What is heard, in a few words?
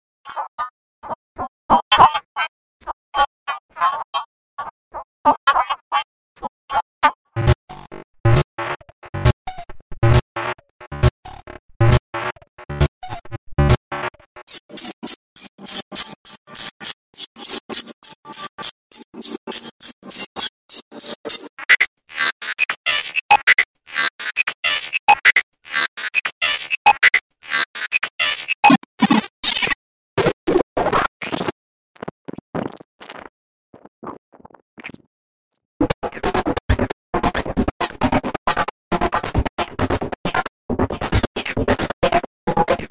Circuit Bent Loops